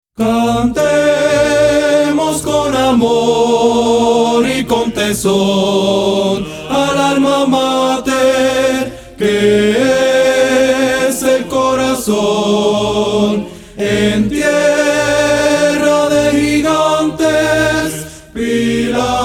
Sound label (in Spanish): Himno de UPR Carolina Tenores, Coro; coro-y-banda